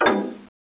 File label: hook knock